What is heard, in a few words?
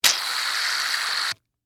Shaving Cream, Spray; Household; Spraying (Sound Effects:Objects); BlastWave